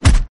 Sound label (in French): tampon